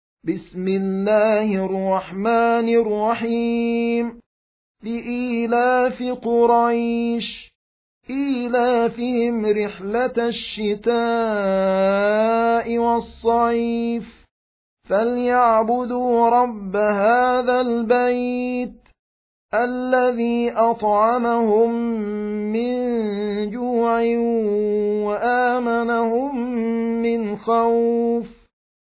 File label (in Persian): ترتیل; سوره قریش